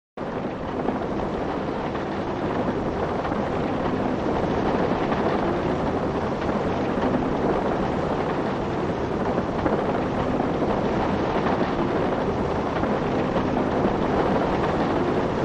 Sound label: rain loop